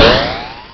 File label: stargun; alienfrenzy